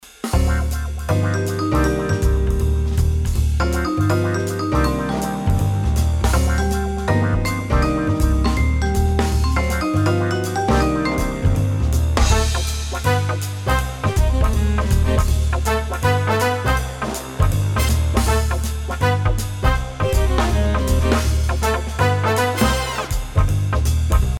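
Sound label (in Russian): джаз рингтон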